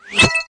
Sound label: vacuum